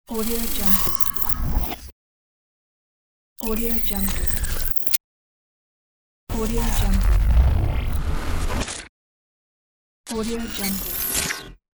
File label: futuristic transformating